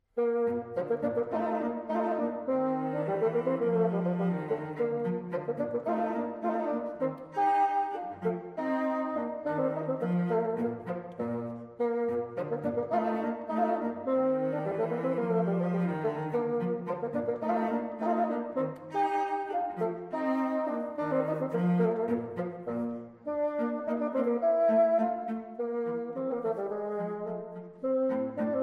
Sonata In B Flat For Bassoon and Violoncello, Allegro